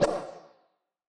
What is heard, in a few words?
anime whoosh